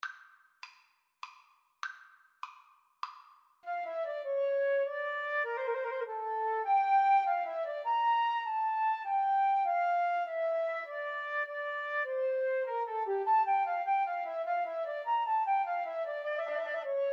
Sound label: Minuet, Flute-Clarinet Duet; Arne,Thomas - Minuet from Sonata no.6